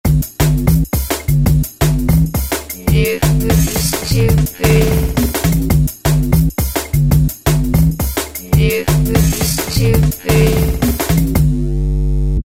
Cyber Funk, Korg ES-1; Factory Patterns

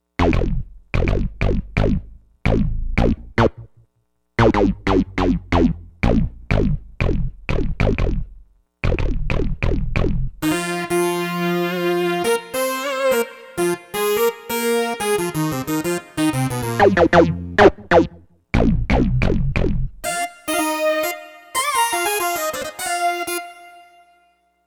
70s Bass Split; Digital Synthesizer

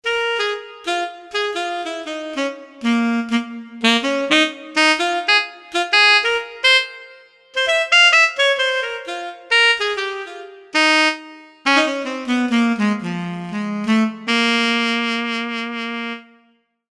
Dyno Tenor

